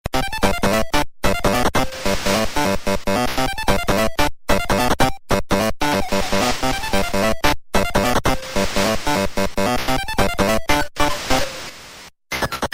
Ship theme; DK'94

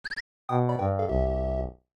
Player Down; SMAS; SMB2

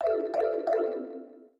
Pipe Travel; SMBW

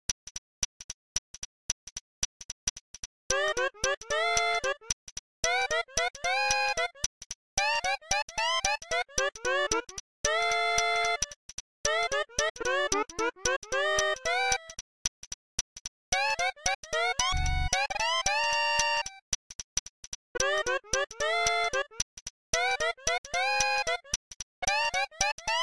Title Distorted; Mario Paint

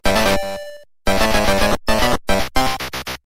Bonus Game Won!, jingle; DK'94

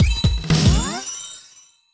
Coaster Set Open

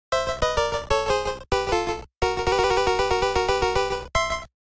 Game Over theme

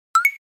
Beat Enemy Sequential

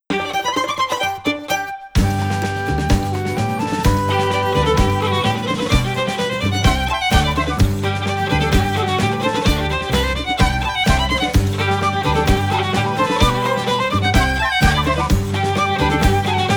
Theme of Moo Moo Meadows

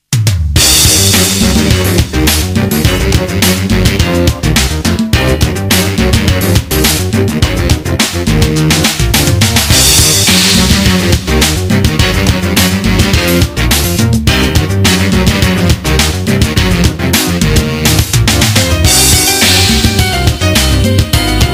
Greenhorn Forest music

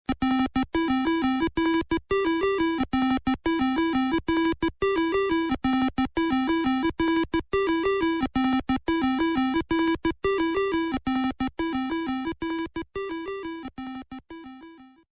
Hammer theme; DK'94

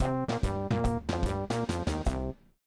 lose; MvDKMMA; Room B-8

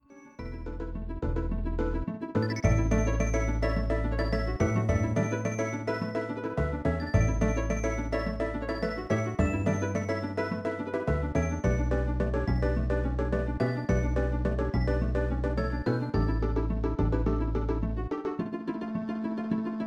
Ice Cave Chant; DKC; SNES

